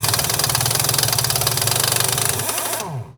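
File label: Impact small, air tools, industrial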